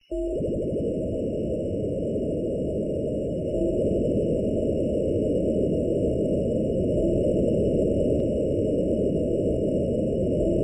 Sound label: creepy ambience; Undertale